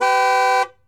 Truck Explorer Horn; Trucks

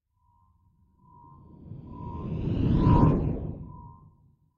sea object; Blind Drive

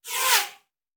Bullet Pass By; Sound Ideas; Guns

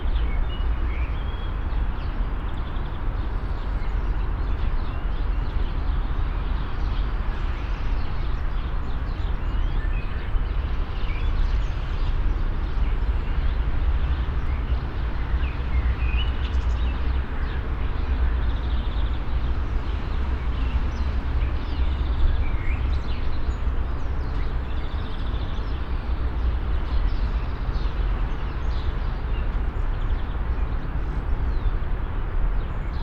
Urban park day birds traffic rumble; urban and suburban; boom library

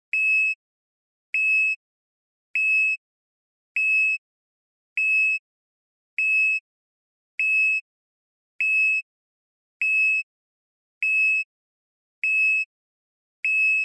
Fire Alarm Beep; blindside

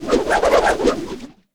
Swish Twin Sword Twirl; Weapons

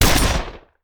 Shotgun; Sound Ideas; Guns

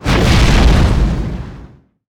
Volcano Lava Erupt; Destruction

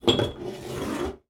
Glass Put Down and Slide, Kitchen; 1001 Sound Effects

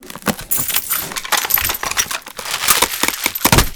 pack open; firefight

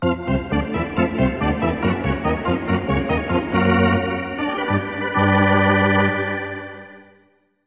Hockey Organ

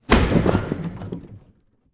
DEMOLISH Wood & Metal Deep; stereo; Universal Sound FX

